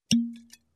Bottle Cork Pop up

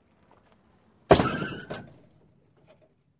Car Crash with Brakes Sound

